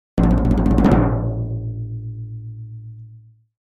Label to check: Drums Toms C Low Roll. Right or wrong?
Right